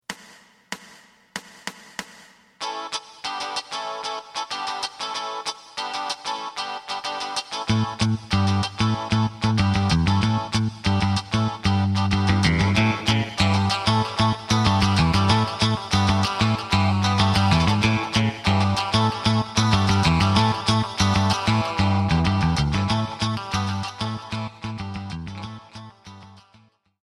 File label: drum